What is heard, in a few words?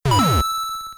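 coin block; modern warfare 2; super-mario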